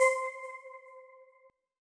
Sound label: Bell; MPC expansion